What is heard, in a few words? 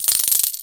money coins stack fast; sound effects one